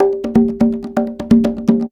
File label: Percussion Loops, Bongo & Conga; Houseworx